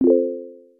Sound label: chime rising